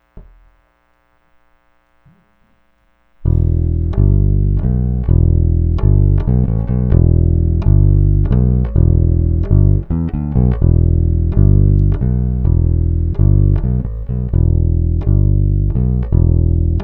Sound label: bass